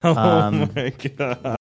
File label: laughing oh my god